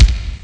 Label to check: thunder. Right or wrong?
Wrong